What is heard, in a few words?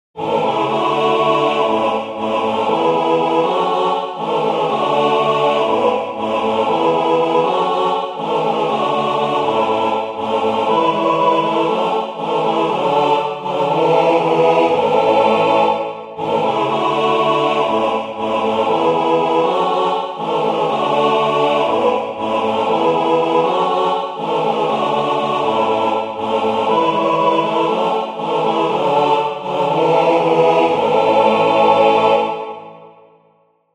SATB MIDI